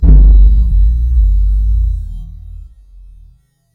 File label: boom